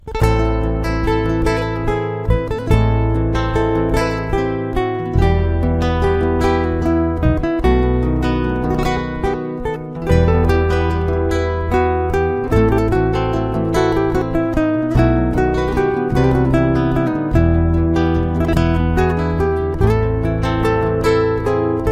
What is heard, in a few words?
Guitar